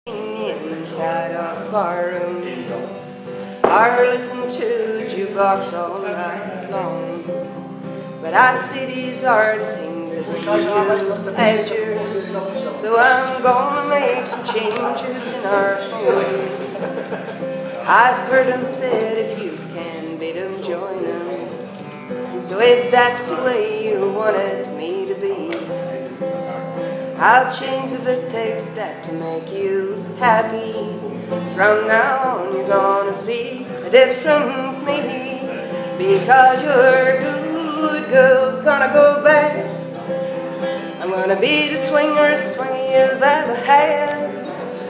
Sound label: country